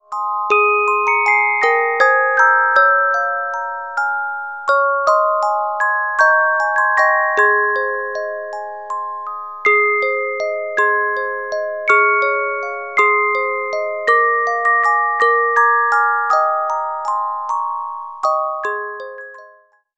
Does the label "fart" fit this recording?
No